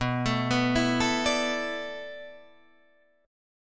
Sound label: B Minor 11th B down Guitar DADGAD; arpeggiated